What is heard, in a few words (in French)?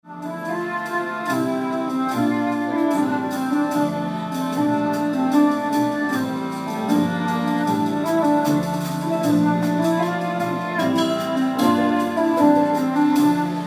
soiree jazz au pannonica enregistrement